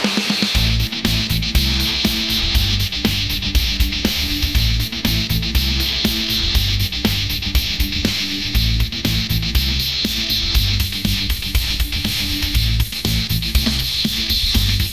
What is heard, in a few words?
synth pop power surge